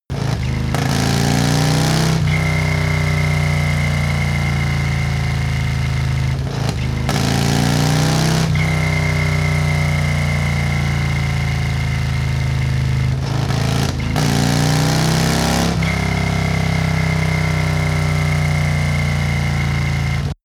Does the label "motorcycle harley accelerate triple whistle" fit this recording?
Yes